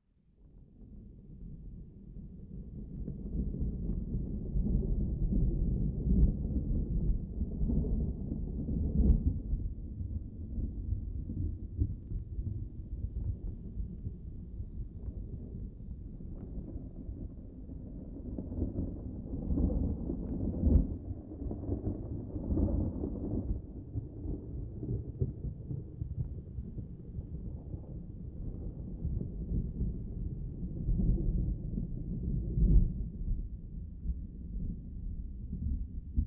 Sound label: Thunder Rumble (No Rain)